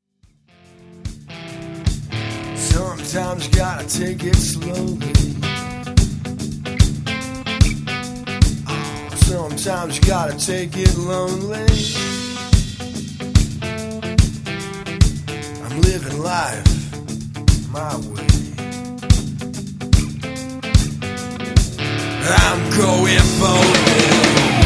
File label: JCM800 Classic Mod